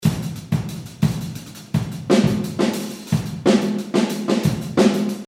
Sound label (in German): Drums